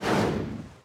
Woosh; Cars; XBOX

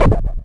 Plasma Rico, Plasma Rifle

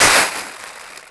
fence; WhiteOut

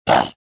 pain

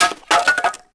wood hit wall; impacts explos

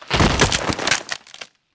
deadfall grass

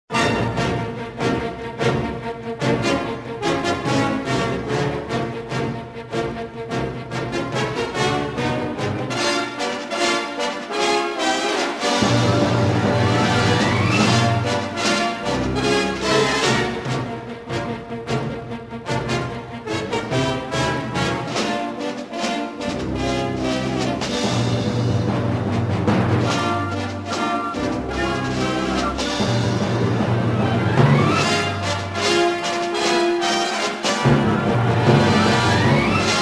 action; Star Wars Jedi Knight I Jedi Academy; XBOX

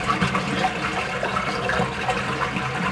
gutter; Tony Hawk's Project 8; XBOX

